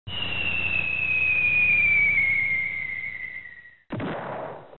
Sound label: falling; Loony Tunes